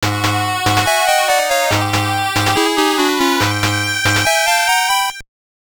Jingle; kidou senshi gundam ghiren no yabou tokubetsu hen aokisei no hasha; wonderswan color

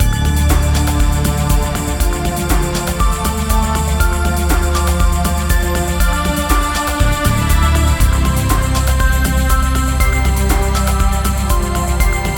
LOOP DIVE; Garfield dmx minigame

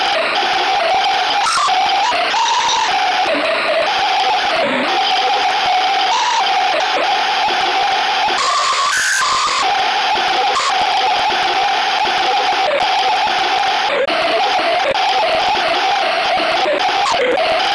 static; mangle; Five Nights at Ronald's

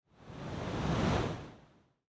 time travel rocks swoosh; teardown; ps5 windows xbox series xs

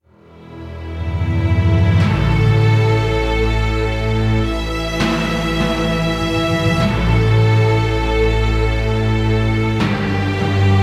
TENSION

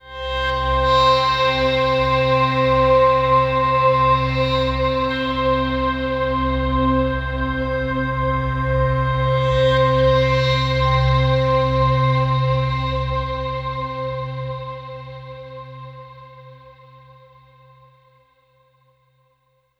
Overlay Drone

